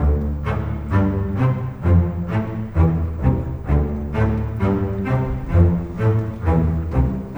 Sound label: Strings; Sonic Foundry (Sony Creative Software); Orchestral Series VOL-4; Rock and Pop; Rock-Pop 15